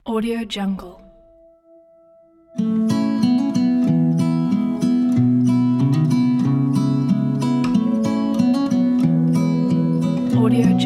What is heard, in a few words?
acoustic heartwarming indie folk; birdbirdbird